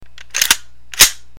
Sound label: Rifle Bolt; Clip